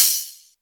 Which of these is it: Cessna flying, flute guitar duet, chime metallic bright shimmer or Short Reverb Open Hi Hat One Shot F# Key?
Short Reverb Open Hi Hat One Shot F# Key